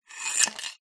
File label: small metal object coin slide inside metal pole; foley